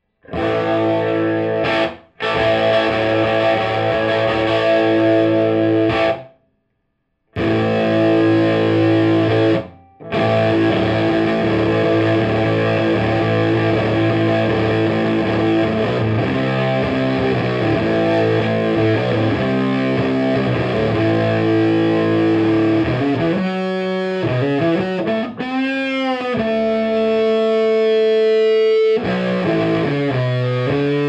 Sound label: Piglet Fuzz